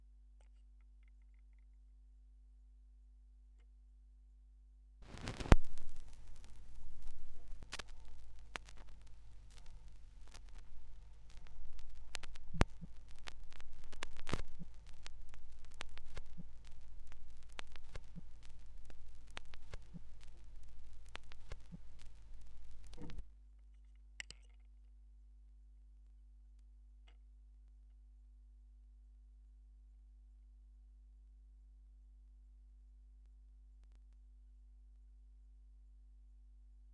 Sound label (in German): schallplattengeraeusche